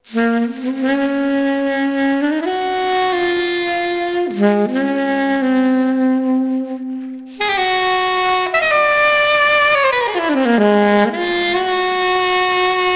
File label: sax